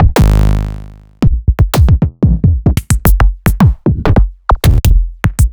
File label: Kicks